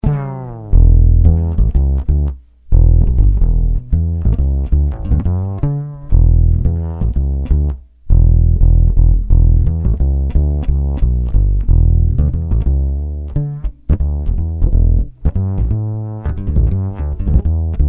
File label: funk